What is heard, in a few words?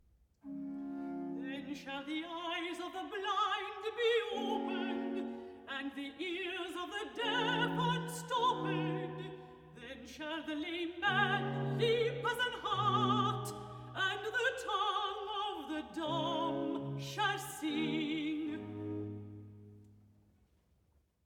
Recitativo (Soprano I) "Then shall the eyes of the bli; Messiah